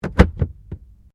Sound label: Car Toyota Corolla Glovebox Close; 2009 Toyota Corolla hatchback; Cars (Sound Effects:Vehicles)